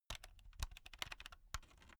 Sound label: insert usb laptop wrong, elektronik; objekte